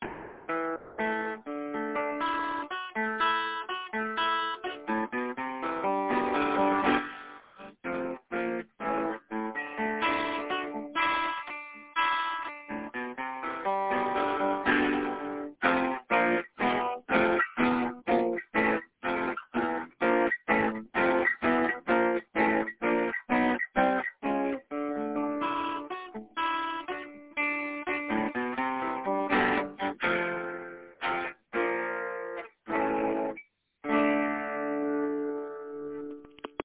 like into chromatic punk

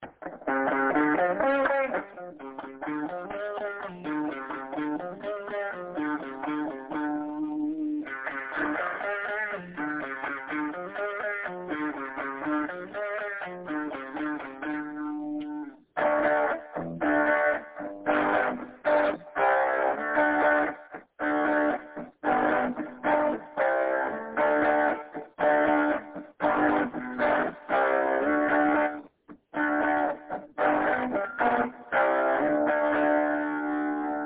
starts awkward ends stadium rock

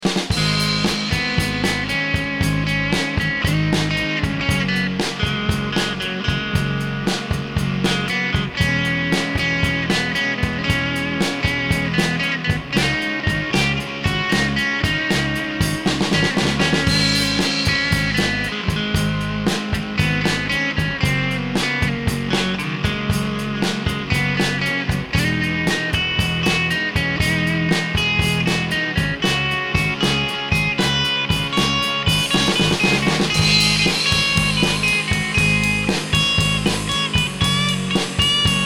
drum Beat with Bass

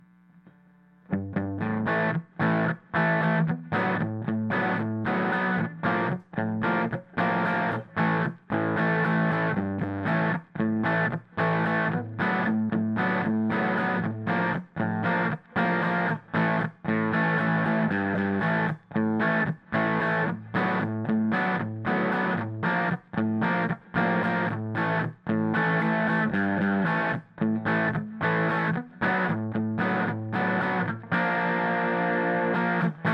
guitar Tones comp Boost fender Model

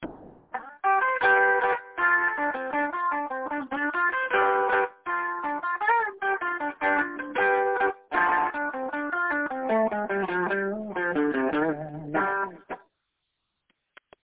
rock lick

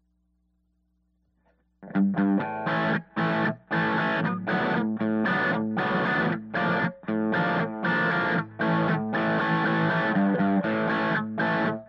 amp Close To Wall

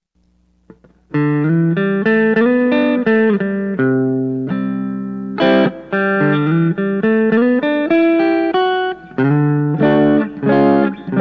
slow Country like Tune On Mandolin

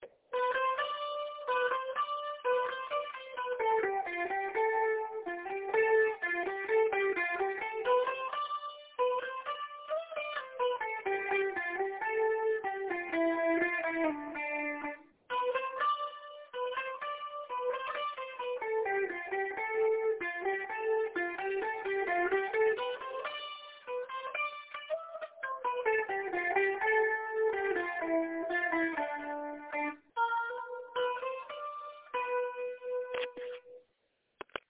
soaring solo

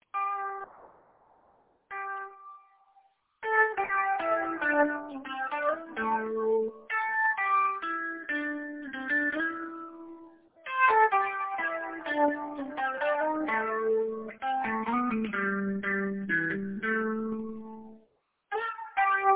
slow pentatonic minor melody

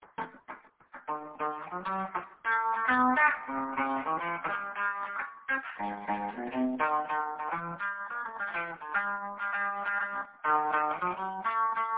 slow sweet home

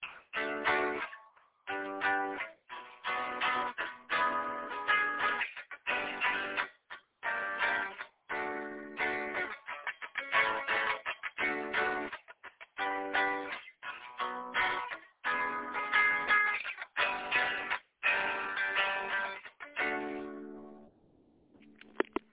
extra 7ths and minors

